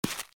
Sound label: Land